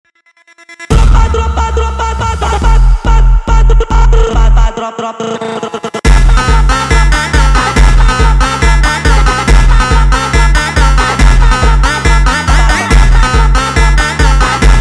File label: Kung Fu Funk speed Up